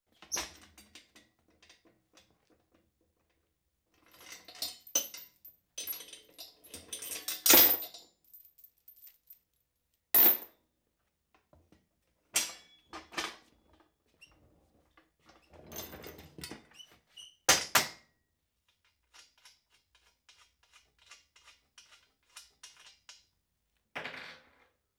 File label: Engine Hoist Assembled (Metal Cla; Construction; BBC Sound Effects Library